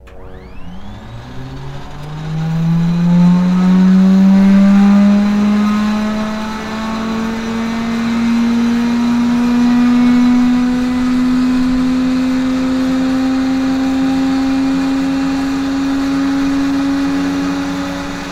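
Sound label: Harvard Engine Start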